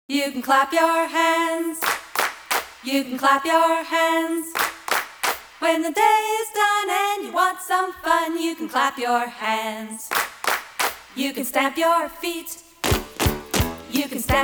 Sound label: clap stamp shake